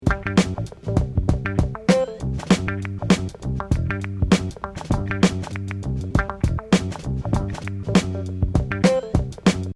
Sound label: loop kicking mono